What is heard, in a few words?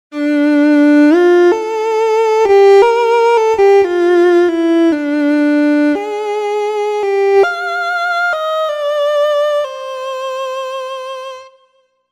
Viola; TURBO VL